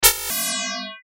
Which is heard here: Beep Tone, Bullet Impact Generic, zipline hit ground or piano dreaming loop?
Beep Tone